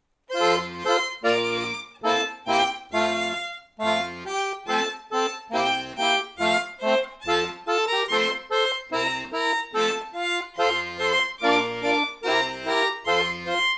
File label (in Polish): Talar talar podklad muzyczny; gwara